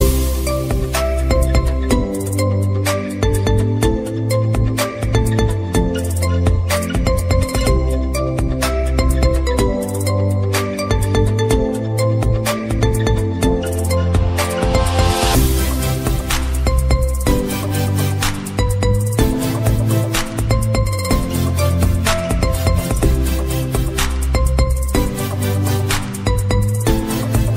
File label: Instrumental Ringtone